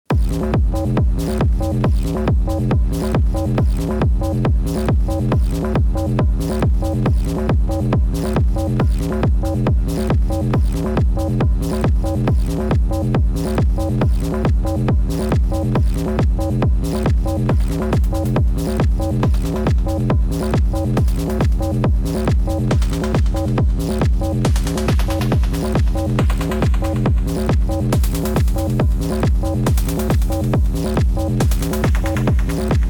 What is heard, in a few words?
Jam with Rytm, Octatrack and AK through Lunar Delay; same pattern as last time, trying to make it a bit more interesting